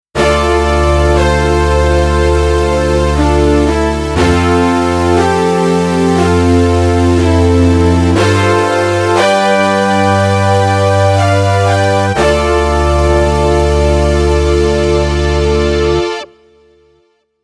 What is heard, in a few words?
Roland AX Synth 80s Brass